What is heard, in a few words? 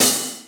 open high hat sample f sharp key